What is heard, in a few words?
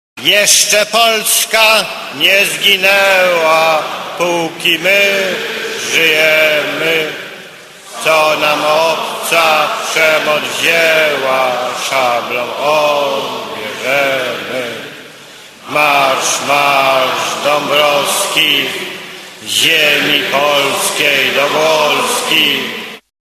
hymn